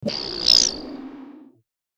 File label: movement power boing spring; sprout